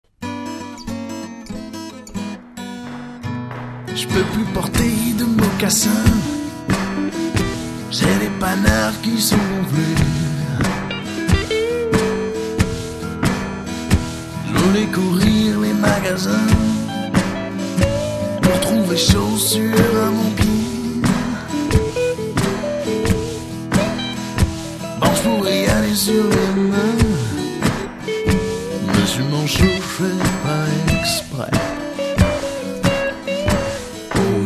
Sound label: blues